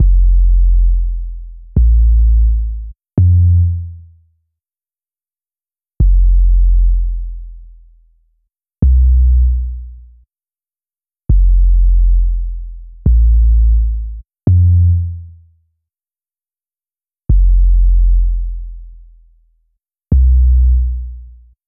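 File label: 170 F minor Synth Sub Bass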